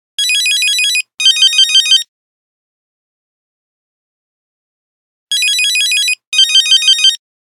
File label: Intro, Nokia Buzzer; Windows Phone apps